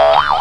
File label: BOING